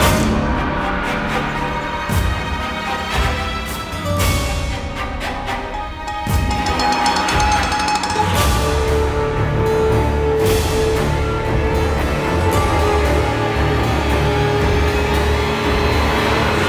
String; Assassin's Creed Unity Dead Kings

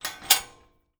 LOCK Metal Sliding mono; Don't Be Afraid The First Toy

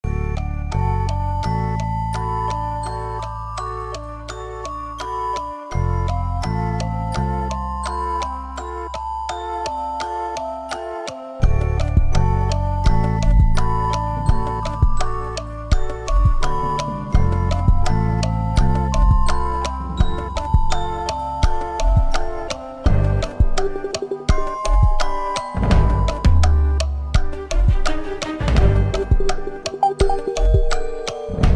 In Game Music 60 Second Countdown; Kahoot

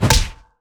Baseball Bat; Worms Reloaded

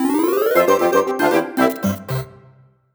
Home Menu Banner; pushmo 3ds ost

